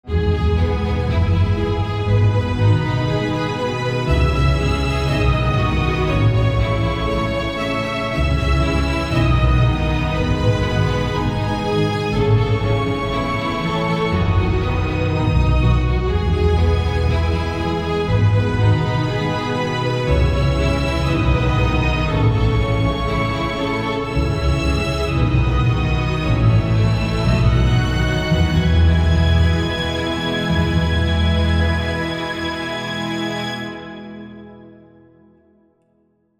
Strings Mix; illusion

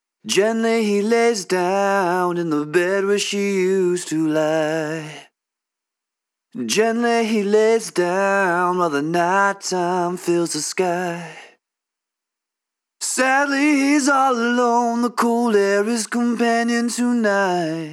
Softly Vocal Lead Dry; illusion

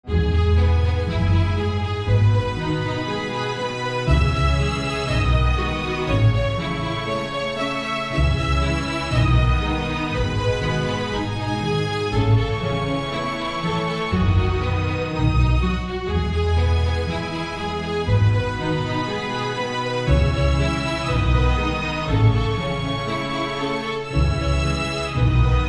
Strings Dry; illusion

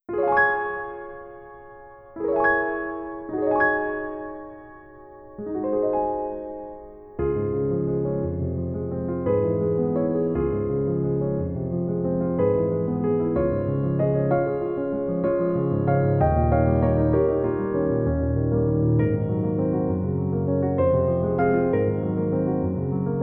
Mix Chapel; Communion Chapel; illusion

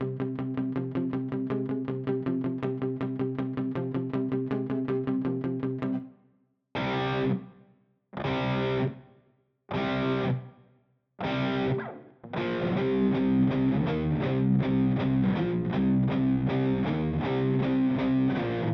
Guitar Ambience Long Ambience; Seventh Heaven Professional

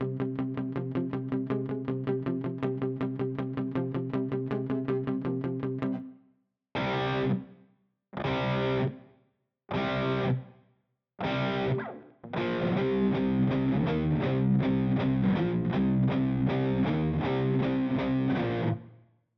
Guitar Ambience Large and Bright; Seventh Heaven Professional

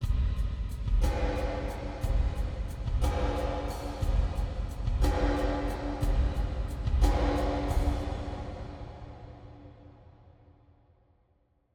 Hall Drums Wet; Boston Hall A; 5.3s; Seventh Heaven Professional

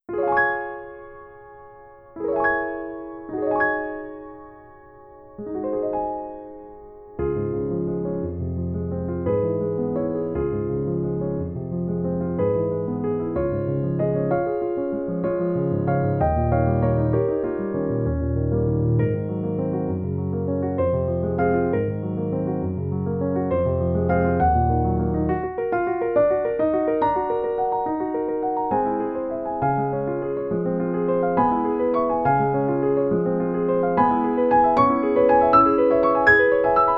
Dry; illusion

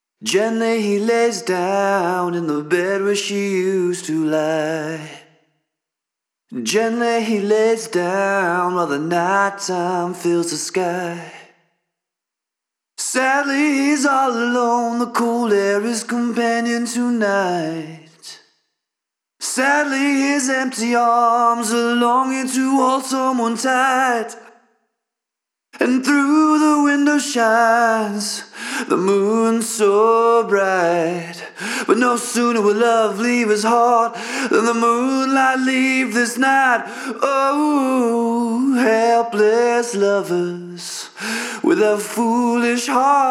Vocal Lead Mix; illusion

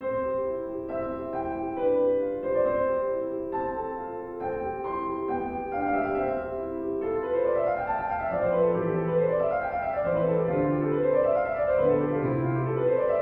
Sonata No. 16 C major - Wet; Chamber Of Illusion

